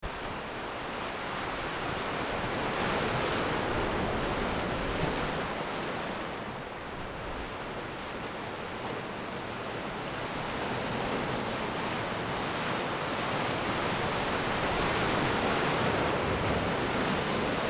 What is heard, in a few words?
ocean